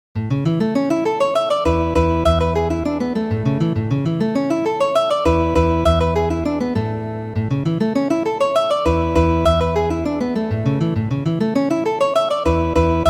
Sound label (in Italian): valses, guitar